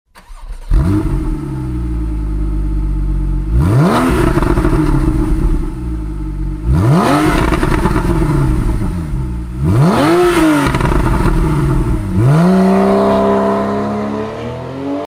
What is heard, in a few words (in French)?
Sonnerie Porsche Carrera S PSE Ouvert 911